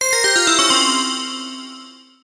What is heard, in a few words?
Win; Temple of Luxor